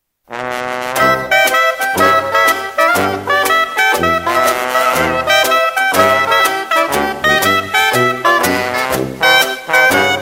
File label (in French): trompette